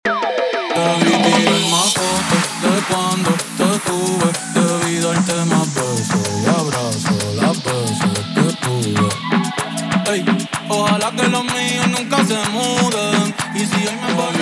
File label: intro outro 126 bpm